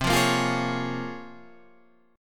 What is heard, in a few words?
Bb Minor 7th C; Bbm7/C strummed